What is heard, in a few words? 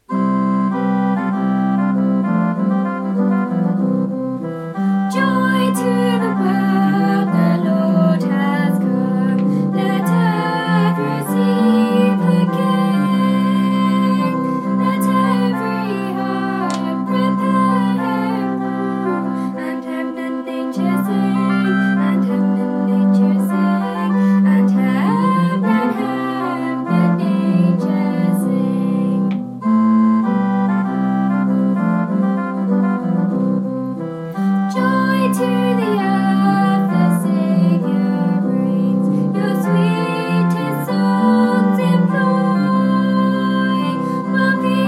Soprano; Joy to the World; Christmas